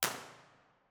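middle tunnel 1 way, impulse response, Innocent Railway tunnel; b-format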